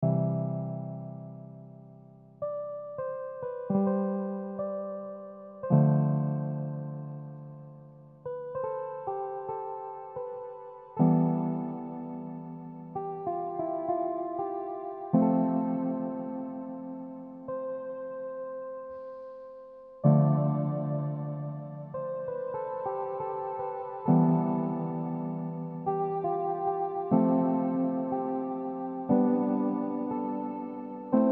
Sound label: piano solo